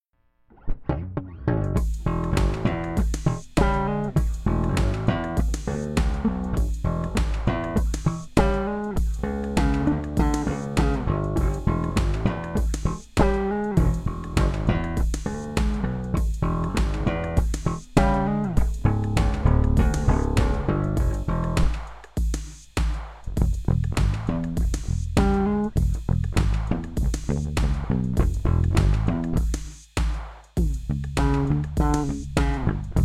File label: funk